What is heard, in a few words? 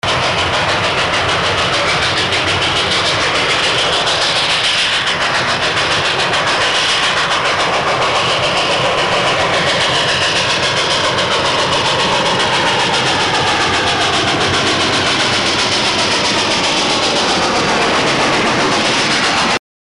steam